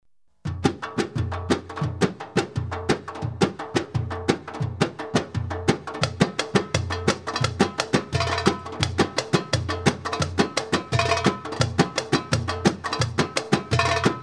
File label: Oriental Solo Tabla